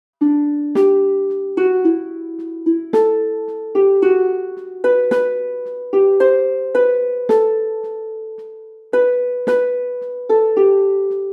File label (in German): midi die fanfare 1-stimmig; Der Schlachtruf hallt, der Lockruf schallt — Die Fanfare